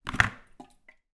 household kettle cordless pick up from base plastic